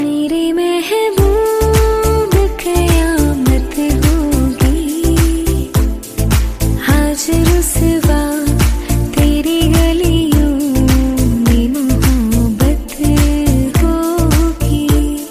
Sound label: Female